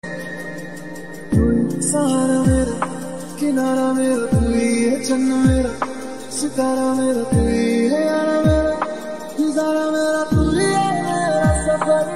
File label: Love Song